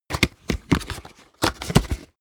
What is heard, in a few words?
box cardboard small open; objekte